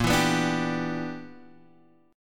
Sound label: E Minor 7th Bb; strummed